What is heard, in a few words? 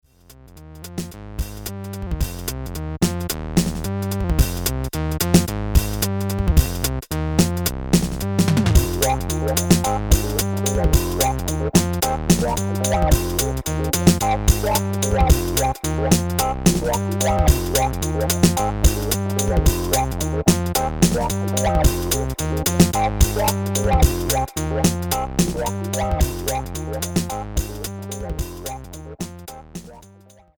live looped studio rhodes